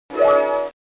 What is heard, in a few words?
harp; Solitaire